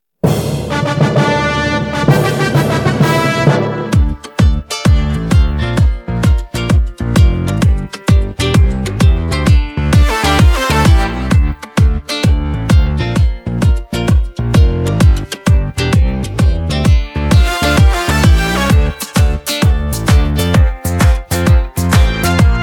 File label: instrumental